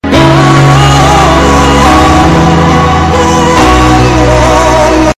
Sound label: bass